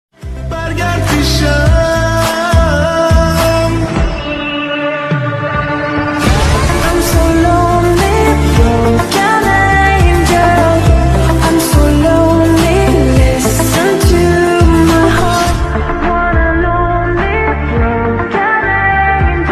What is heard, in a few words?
female english ringtone